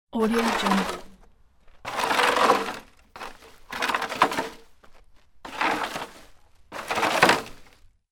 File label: dragging plastic box on rough surface; fxprosound